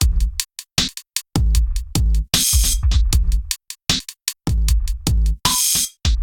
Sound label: music; RapidTyping Tutor